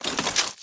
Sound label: armor